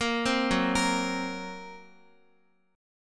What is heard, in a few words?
Gb Major Flat 5th, Guitar; arpeggiated; JGuitar